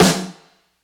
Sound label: drumbreak snare dry; Hiphop